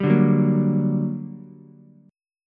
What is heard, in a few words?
G Minor Major 7th G, Ukulele; strummed; 0,2,2,1